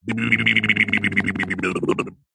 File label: cartoon voice grumpy head shake or lip wobble